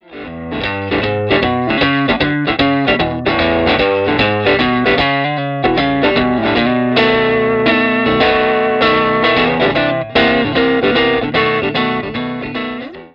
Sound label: STRAT NECK WARM DRIVE OVERDRIVE LICK, S pickup set